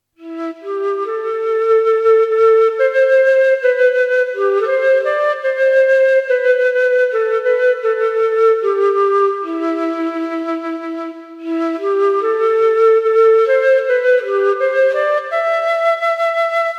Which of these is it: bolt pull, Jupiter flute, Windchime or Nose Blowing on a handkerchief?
Jupiter flute